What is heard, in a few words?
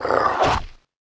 Ratman Atk